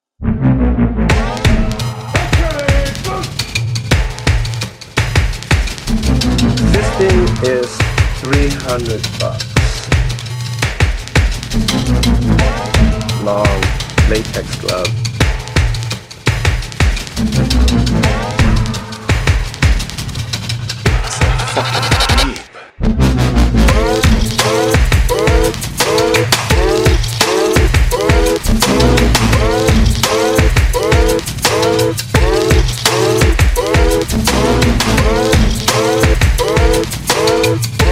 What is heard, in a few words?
Gachi Remix; Minecraft